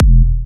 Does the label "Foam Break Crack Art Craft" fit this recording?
No